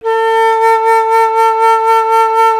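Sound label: flute A; Miroslav Vitous - Solo Instruments VOL-2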